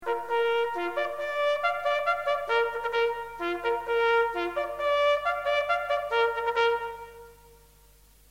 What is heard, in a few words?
Fall In, Bugle Band; the boys brigade